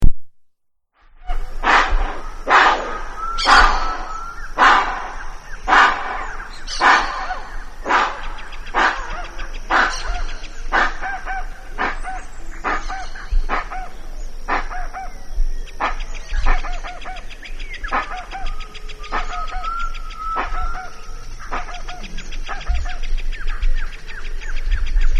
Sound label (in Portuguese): canto do jacu de barriga castanha